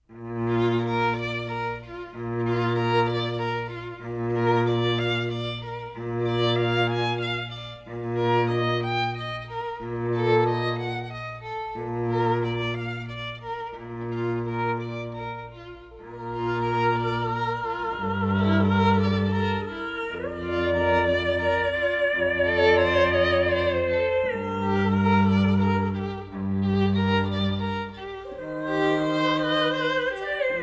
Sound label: Ave Maria Soprano and String Duo; Schubert